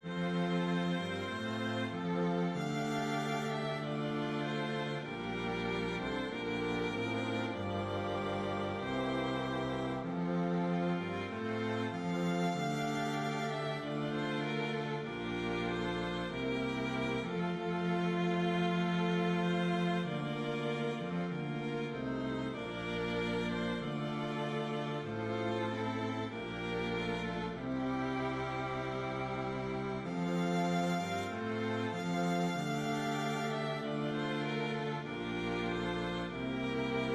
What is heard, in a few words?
Hymn Strings